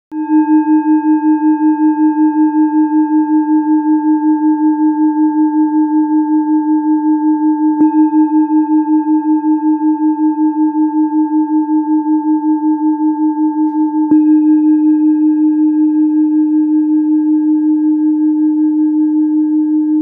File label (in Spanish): Beng re 310, cuencos tibetanos